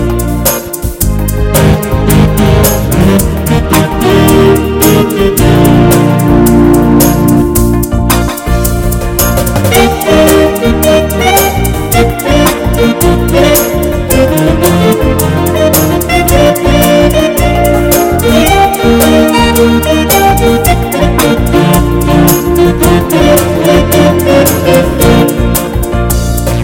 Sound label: A Slow and Happy Pop